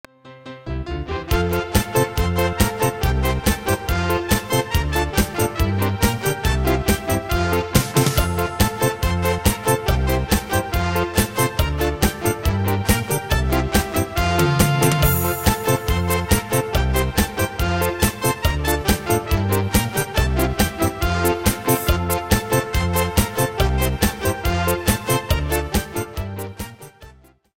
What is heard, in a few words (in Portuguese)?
Ciranda Cirandinha Instrumental